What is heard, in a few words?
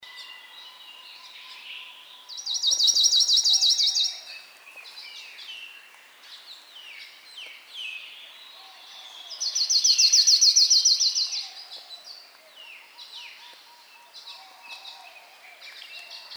Amakihi Oahu song